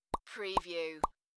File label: Lip pop; beanstalk audio